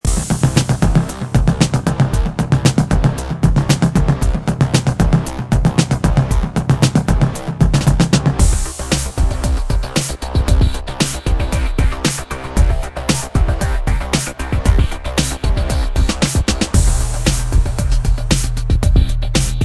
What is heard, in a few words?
Police Chase; Fast and Furious Legacy; mobile